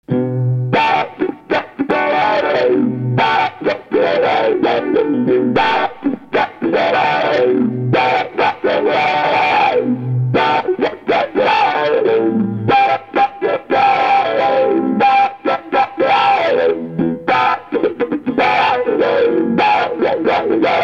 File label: ZIPPER